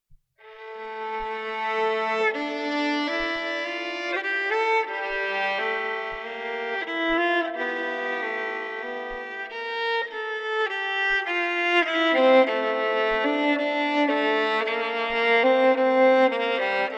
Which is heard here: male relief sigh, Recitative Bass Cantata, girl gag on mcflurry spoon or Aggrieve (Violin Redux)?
Aggrieve (Violin Redux)